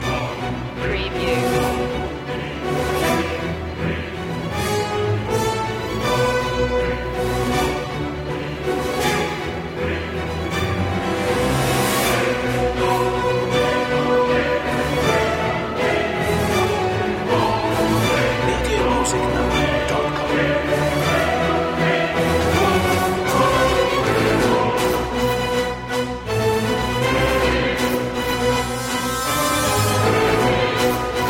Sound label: no perc